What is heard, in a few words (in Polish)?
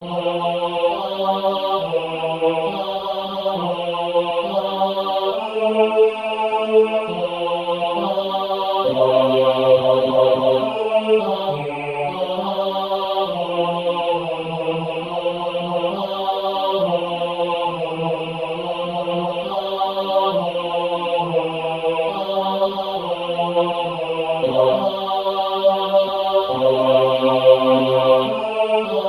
Bas na tle SATB; Oto sa baranki